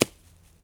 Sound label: ball rough soft; golf; ProStroke Golf World Tour 2007